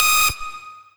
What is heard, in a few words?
lead